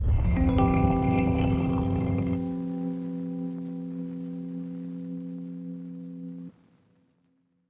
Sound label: Jingles